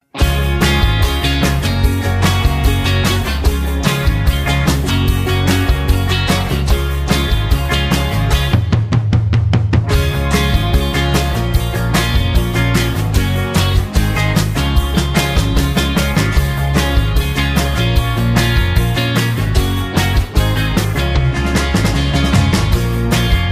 Db No Backing Vocals, backing tracks

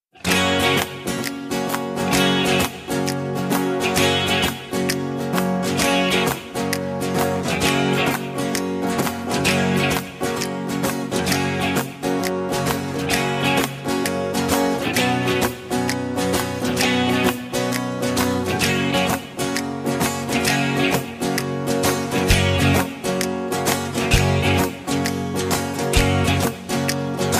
Gb No Guitar Solo, backing tracks

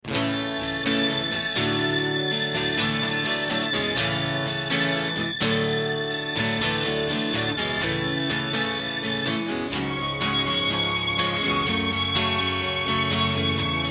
(A) No Backing Vocals

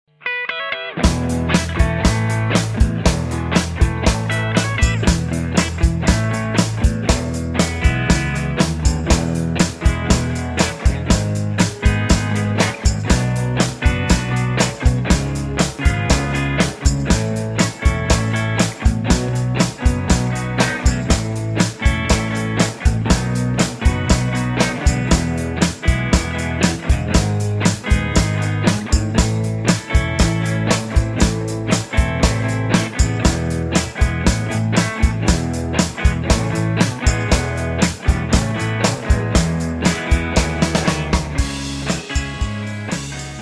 (E) No Backing Vocals

